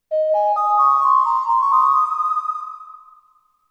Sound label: flute; Legam; PC-9801